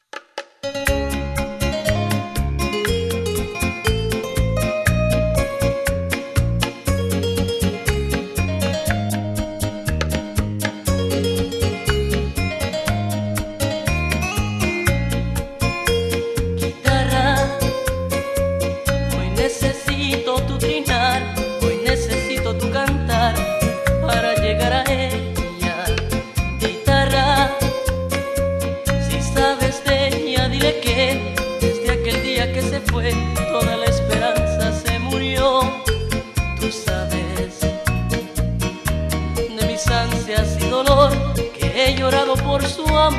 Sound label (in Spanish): Guitarra Bachata; salsa band